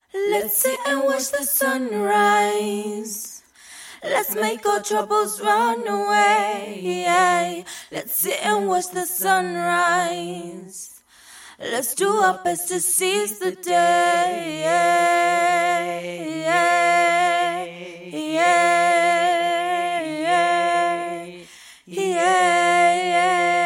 pop rnb 125 Fm, Acapella; Female; Singing

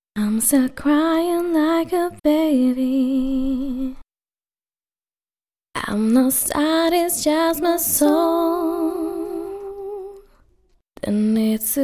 pop rnb 86 G

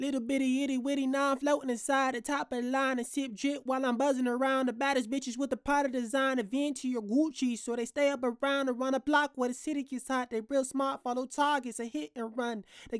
HH 106 Dm

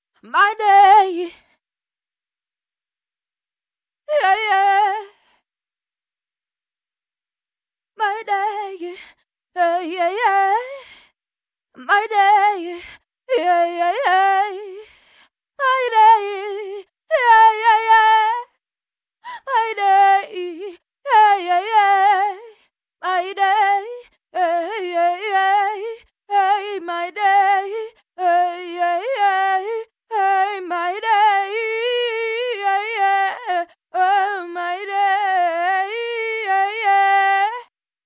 pop rnb african Gm 62

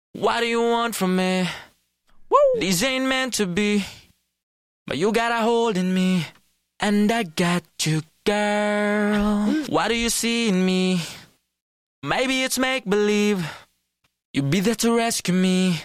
pop 102 AbM, Acapella; Male; Singing

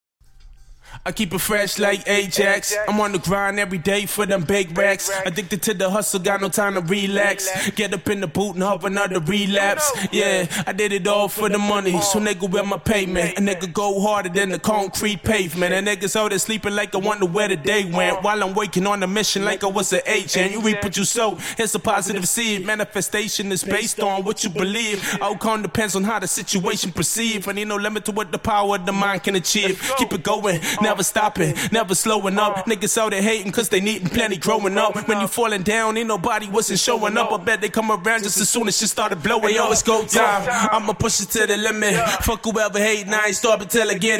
Hip Hop 100 Am

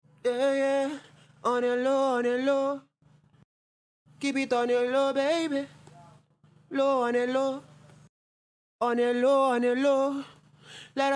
pop rnb 98 EM, acapella

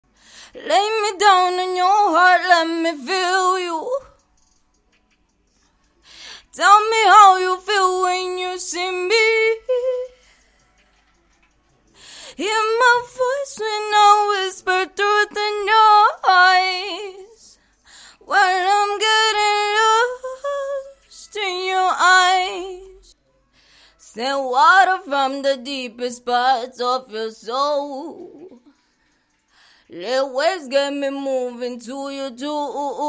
pop female sing 94 Fm

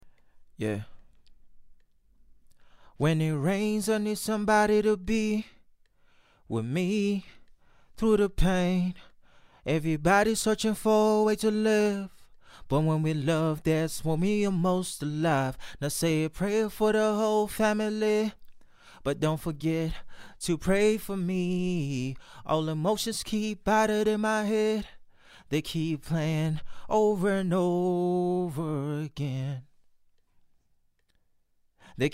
pop 74 Abm; acapella

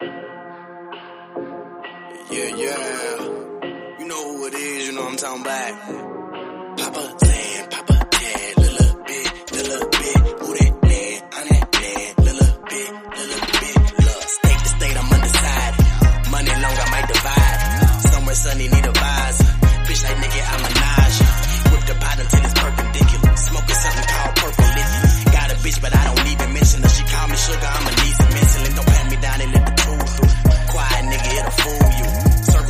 Remix, hip hop/rap

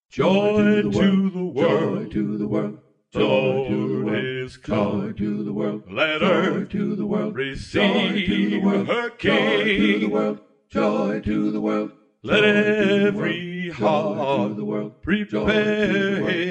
religious 40 A, Acapellas

